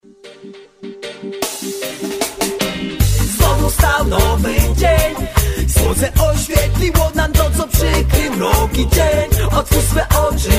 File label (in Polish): budzik, reggae